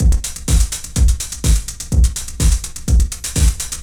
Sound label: DEEPHOUSE BEATS 125